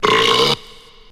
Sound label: burp short